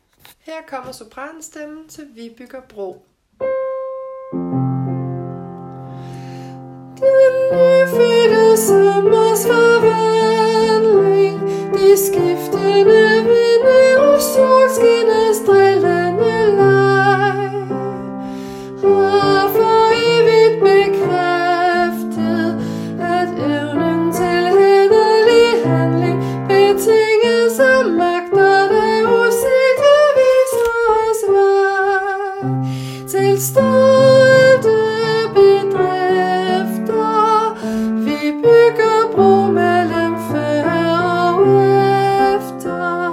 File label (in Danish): sopran